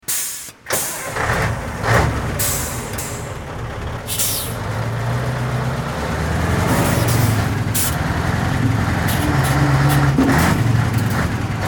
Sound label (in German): lkw fahrt; waveland tonstudio